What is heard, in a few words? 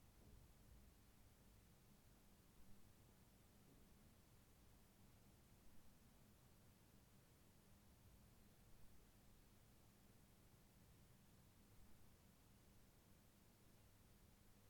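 Ambience Interior Night Room Tone Lisbon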